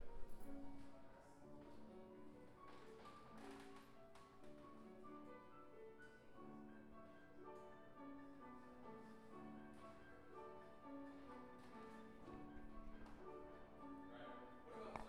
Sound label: Piano Ambience; West Hall